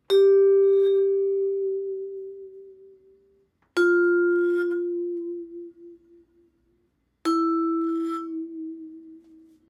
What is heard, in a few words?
vibe wah wah, vibraphone